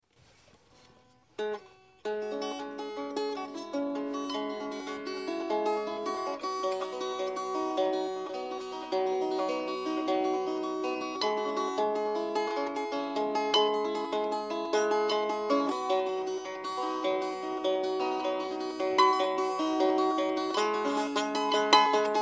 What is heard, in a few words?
drone banjo